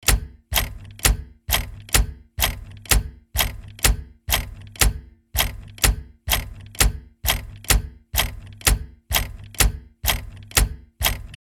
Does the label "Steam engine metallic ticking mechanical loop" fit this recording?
Yes